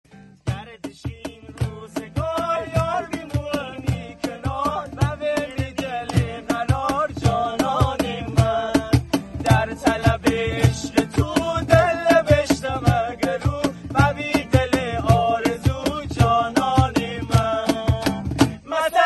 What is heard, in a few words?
guitar